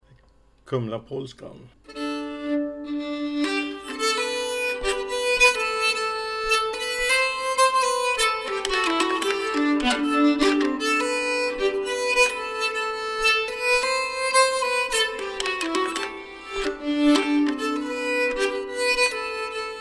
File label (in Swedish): Polska G